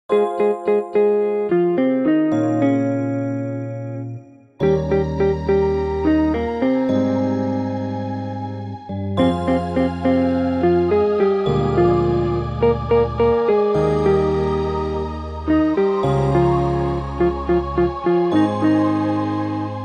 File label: brass minus